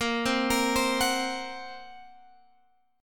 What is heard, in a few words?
A sharp Suspended 2nd Sharp 5th A sharp, Guitar Open G